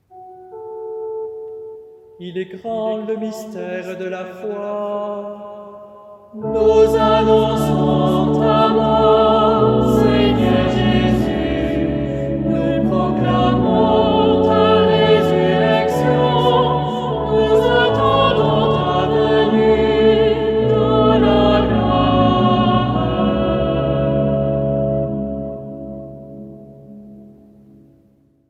Il est grand le mystère de la foi; mariage

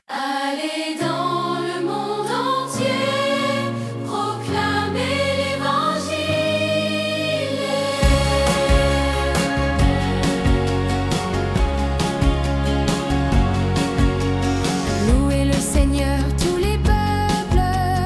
Chant entree psaume

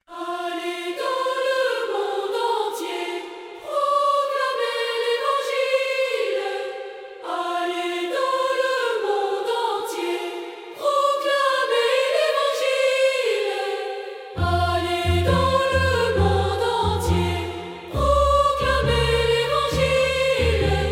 Psaume; Psaume 116

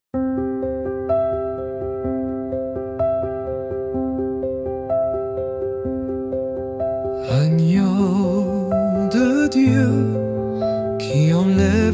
Agneau de Dieu; rites adieu

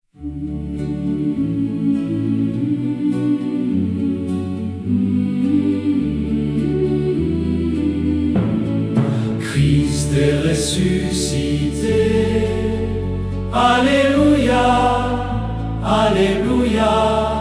Acclamation; 2e dimanche du paques

